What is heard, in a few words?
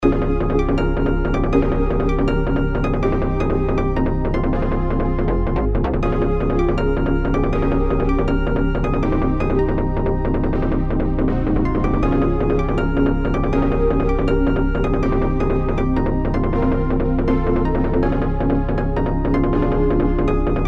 bass